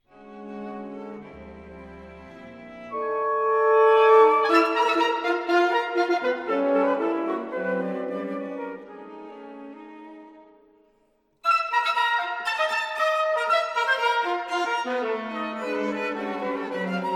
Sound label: Nonet